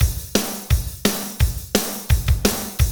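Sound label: tek rock, Roland drum beats; Northstar - Drumscapes Roland; Fast Tekno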